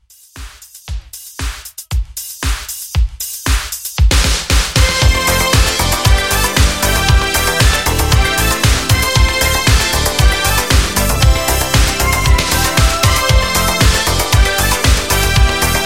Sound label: 80s Disco Pop Re-Drum 116